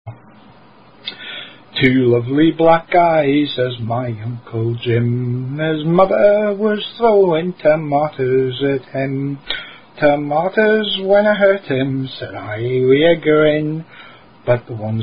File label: Singing; Two Lovely Black Eyes